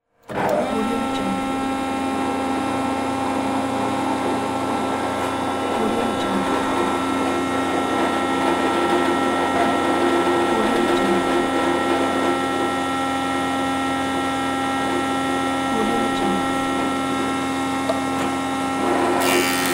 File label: thicknesser machine small